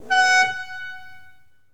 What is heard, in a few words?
Ferrari Testarosa Horn; Automobiles